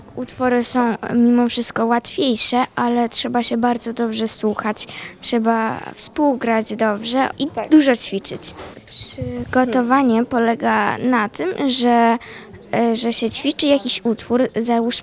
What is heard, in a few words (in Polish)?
pianiści